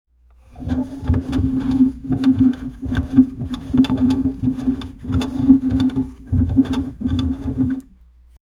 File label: wooden drawer shaking fro; household